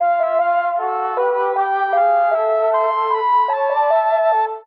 flute with keys, Loops, Sad

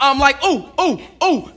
ooh ooh ooh; ooh ahh

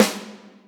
wet, snares real

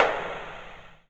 mallet, Misc Percussion Ticks

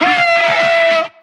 "ho", voice; ooh ahh

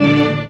brassy, String; Notes

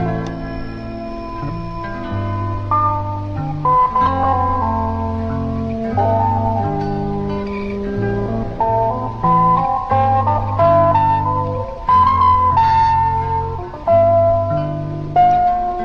guitar and keys, Sad

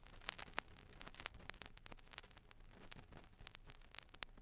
crackles, Vinyl

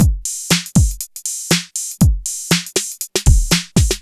booty groover, Drum loops, Electro

